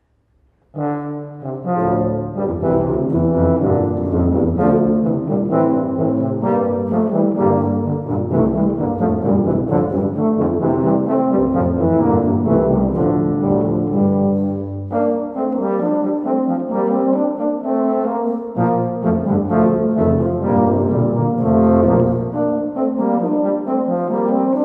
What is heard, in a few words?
Low Brass, low brass quartet; Clement Janequin; Au Verd Boys; chansons; early music series